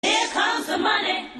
coin actual money

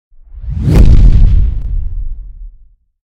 whoosh

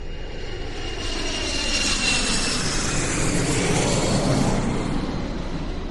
dc9 landing; soundbible

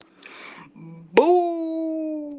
audio message

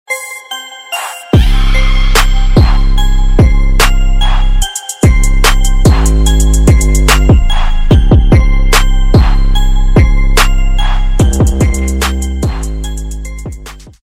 bass trap

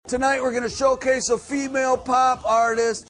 female pop artist

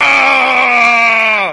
auuuurgh

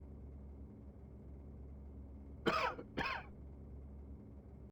cough

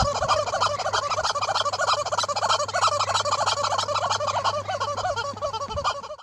horse chicken

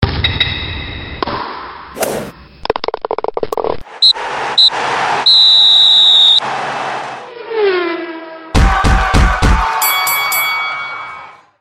sports sounds

